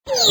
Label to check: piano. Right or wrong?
Wrong